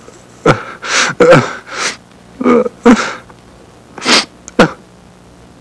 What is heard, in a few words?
clock chime